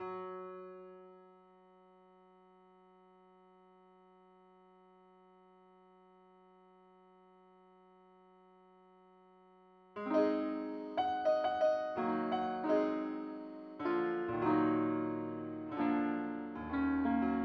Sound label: piano